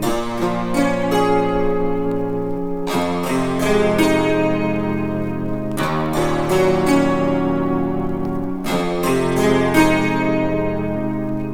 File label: guitar, Loops, Sad